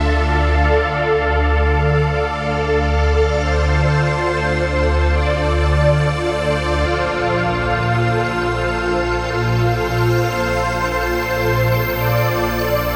Pad Full 140BPM, D minor; Melody & Synth; Vengeance Samples

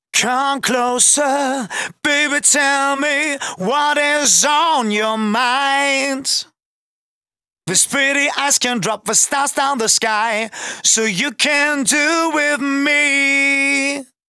Male Vocals Vers DRY, 128 BPM; Vengeance Samples; VTDS2 Song Kits

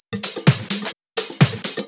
Percussive Loops 128BPM; Vengeance Samples

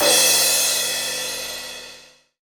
Cymbals Crash; Vengeance essential club sounds-3

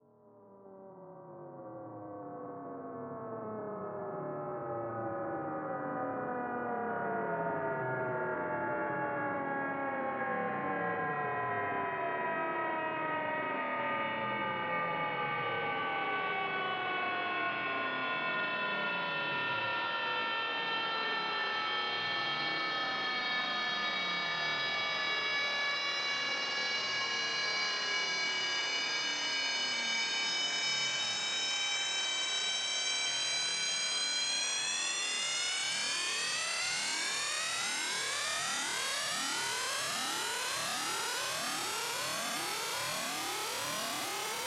SweepFx 140BPM; D minor; Sound FX; Vengeance Samples

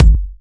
Bassdrum; Vengeance Samples

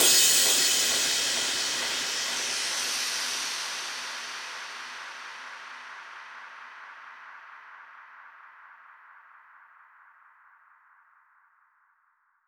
132BPM Crash; Drums & Perc; G#major

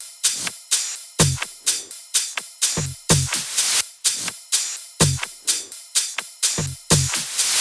Kit 12, 126BPM; Purple Kit; Vengeance Samples

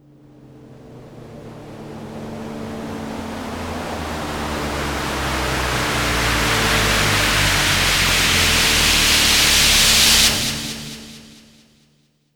Sweeps Long

